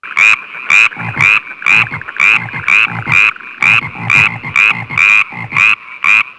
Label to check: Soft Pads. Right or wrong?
Wrong